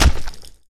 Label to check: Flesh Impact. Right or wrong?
Right